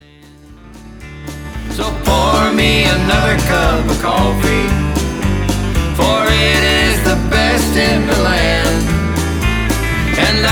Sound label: Music